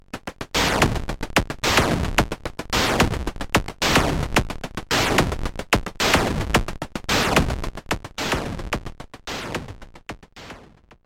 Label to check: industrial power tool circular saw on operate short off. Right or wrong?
Wrong